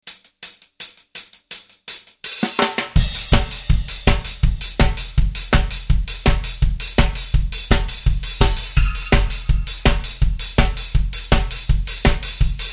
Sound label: Ska Beat 86.5 bpm; Pearl Master Studio